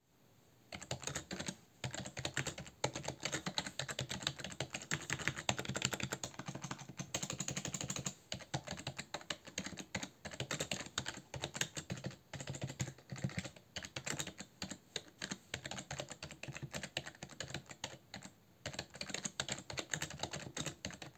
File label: corsair k55 rgb pro xt, claviers; test peripherique